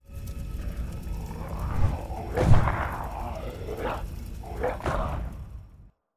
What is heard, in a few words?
idle, monster; darknight